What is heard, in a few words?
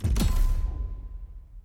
quest Accepted